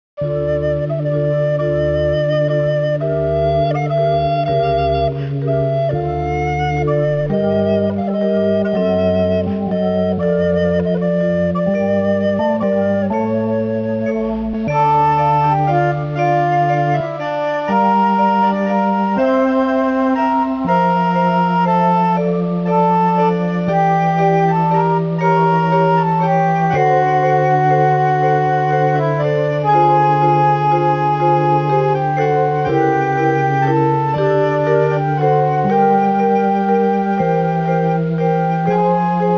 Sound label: largo spiccato; vivaldi